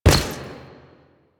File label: Step Default